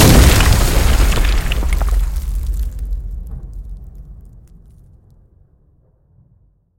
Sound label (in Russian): razrushitelnij gaubichnij udar